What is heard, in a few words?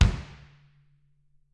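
bass drum; Bob Clearmountain Drums I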